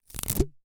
Household Tape Scotch Tape Stuck Together And Pulled Apart